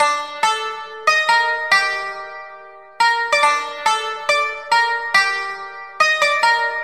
guitar

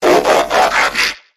transformation, zaps and beeps

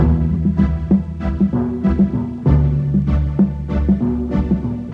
bass, Loops

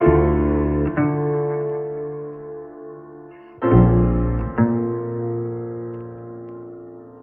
piano, Loops, Sad

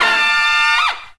horn stab

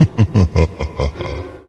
laugh

